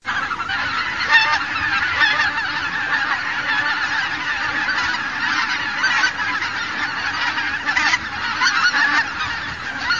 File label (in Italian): OCA DARIO, birdsound